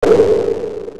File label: freeverb